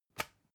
usb port metallic out hard

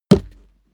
step barefoot metal jump

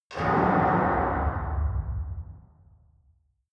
metal hard clunk

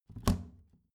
plastic trash can open heavy

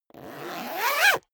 zipper down jacket close accelerate

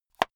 watch case small close hard

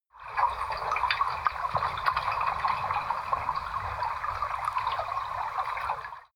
canal

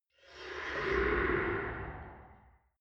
metal soft

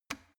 plastic water boiler turn switch on hard

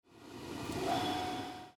exhauster

